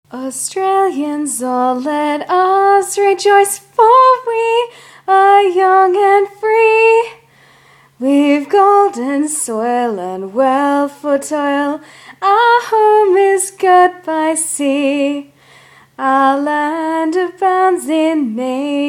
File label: Advance Australia [F]air! singing; [challenge]; [sorry]